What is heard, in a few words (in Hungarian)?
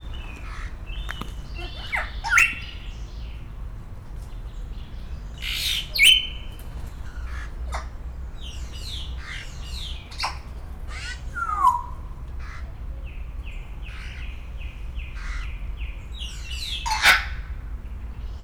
jákó rövidebb megszólalás; szegedi zoo 2011